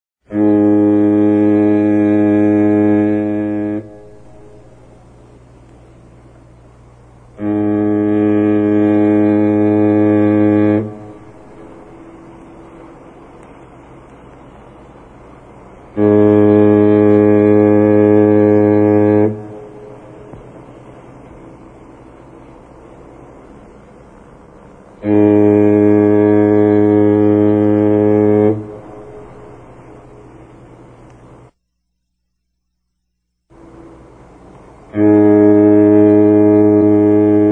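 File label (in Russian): пароходный гудок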